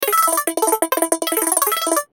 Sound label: Data Readout Digital Computer Sound; High Tech; Multimedia; Production Element Imaging Element Accent Transition; Blastwave